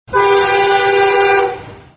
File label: Train Horn Passenger Long Blast Electric Multiple Unit